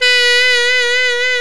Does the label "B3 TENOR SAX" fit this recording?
Yes